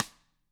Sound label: TAM1 STK, Drums, TAMA; ILIO; Double Platinum Drums 1; TAMA+TAMA